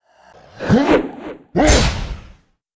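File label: shielder attack, monster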